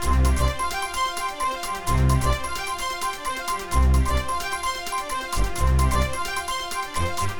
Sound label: Loading Screen